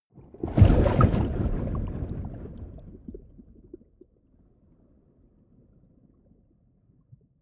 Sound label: whoosh abstract fast long